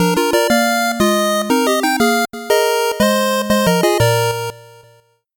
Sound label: 8bit jingle normal